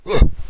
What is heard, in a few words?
cough; super trench attack 2